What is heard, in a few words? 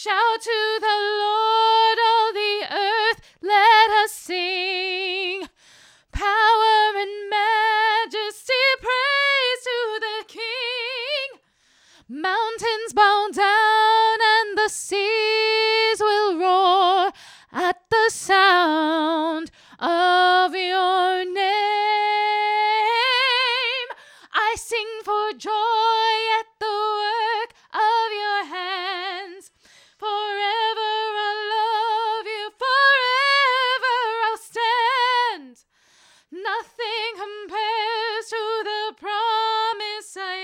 soprano